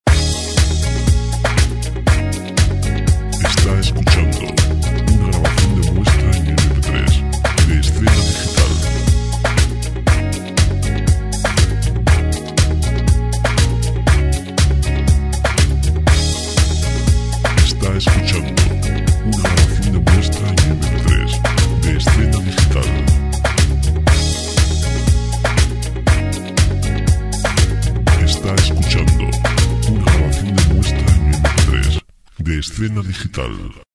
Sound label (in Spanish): rapid 30, sintonias programas; Música sin derechos de autor